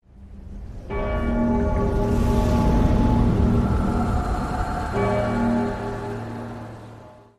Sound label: ominous bell